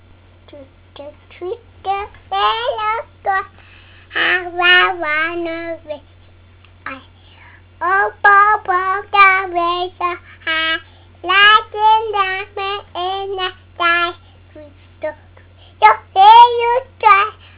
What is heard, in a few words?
twinkle